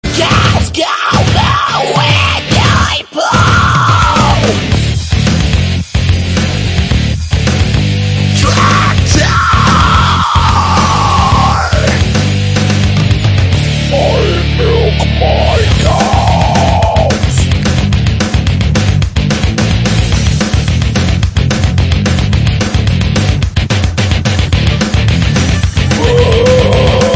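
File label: heavy metal farmer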